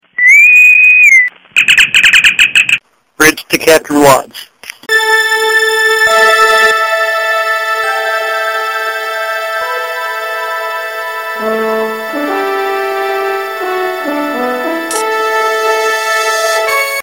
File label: Ring Tone; Trek